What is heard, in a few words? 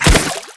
cg water; weapons; Tribes2